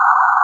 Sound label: Engine; PC Gamer IT CD 48; Big bang